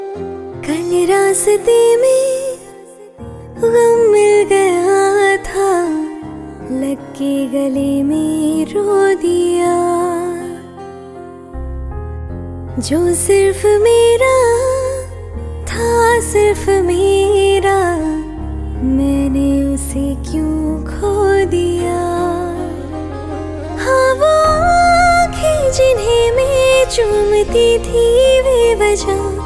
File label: Female Song; ringtones